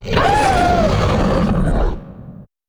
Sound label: die; CosmicRage; combat; creatures; ryuchi